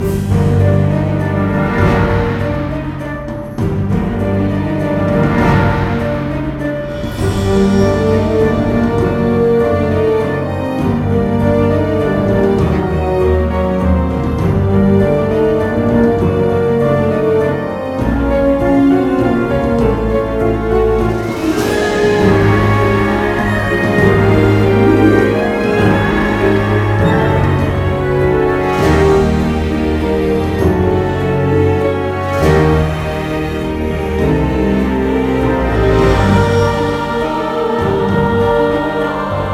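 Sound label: Music Ambient; Alice in Wonderland; Nintendo Wii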